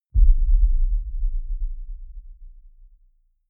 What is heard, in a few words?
deep sub hit heavy